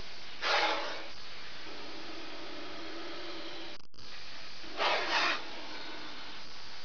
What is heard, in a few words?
cobra; 12000 Graphics Pack - A Complete Graphics Collection For Your PC